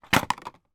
household card box containing plastic toy stars put in storage box